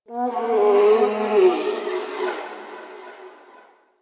Venus Landscape Distant Creatures; Ambience; Warframe; Venus Open World

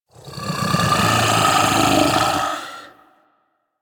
Vocal Snore; Warframe; Hunting Critters; Orokin Kubrow

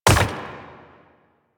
Hand Bomber Proj By Bounce

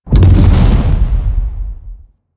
Obelisk Reset; Warframe; Infested Eris

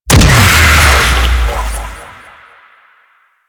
Death Dash, Enemies; Warframe; Teralyst; Vomvalyst

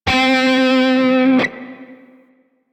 Grineer Shawzin Flourish Oct Three C; Music; DE; Warframe

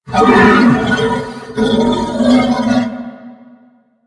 Horse Vocal Screech; Warframe; Vehicles; Duviri

